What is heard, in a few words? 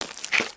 Foot Mud Walk Light, footsteps